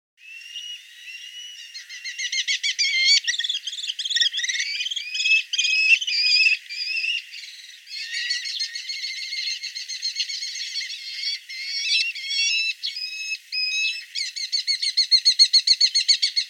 kobchik golos

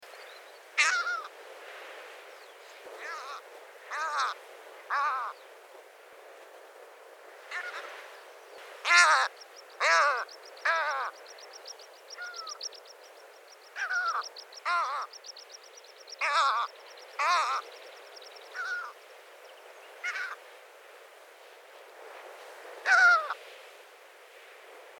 bolshoy pomornik golos

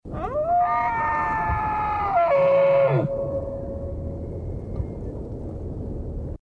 losi golosa

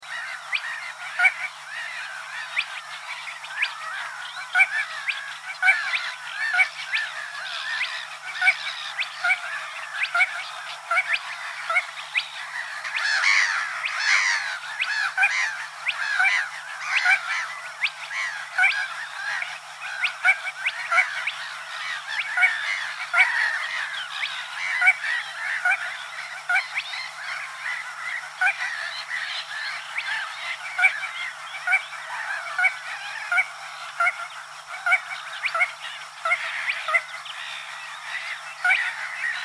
malyy pogonysh golos

